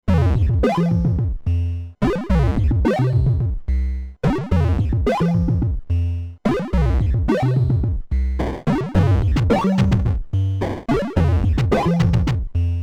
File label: Swing and Arpeggios; Twisted Electrons hapiNES L